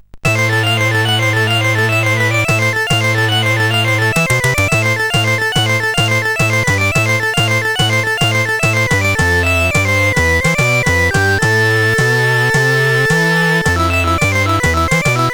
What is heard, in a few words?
-, CSG8580R5